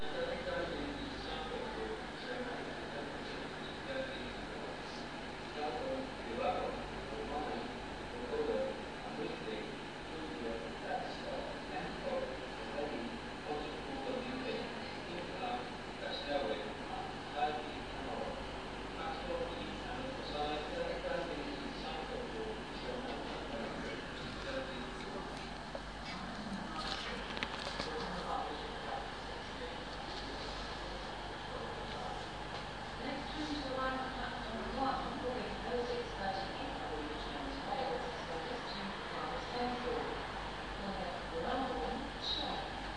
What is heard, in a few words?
wrexham general rly station